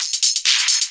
132 telephone beat, drum loops and breaks

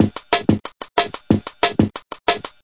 184 bpm, DRUM LOOPS and BREAKS; 181 bpm plus

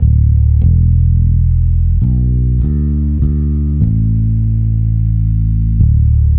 150 slow fingerbass

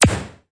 smatter zap

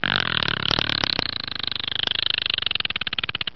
Frog

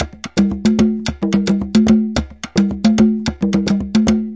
110 afro cuban conga line; 101 to 110 bpm

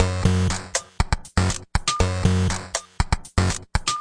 reszo buzzbeat, 120 bpm, drum loops and breaks

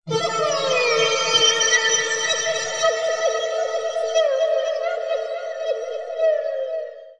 vocal howling flange space

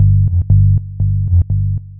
120 echo bass

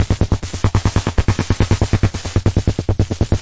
140 wide pulsing elctro, DRUM LOOPS and BREAKS

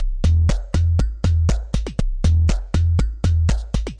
offbeat bass crack clowner, 120 bpm

